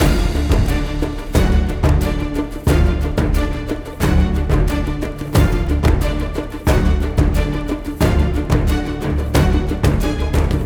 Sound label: Library Percussive; Audio Animals